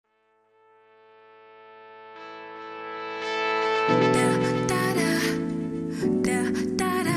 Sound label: Piano Hub Mix